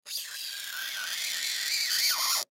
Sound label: Knife Cut Plexiglass Engrave Long; Knife Cut Plastic; Hand Tools; Blastwave; Foley